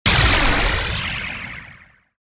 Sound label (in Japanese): ビーム砲 モノラル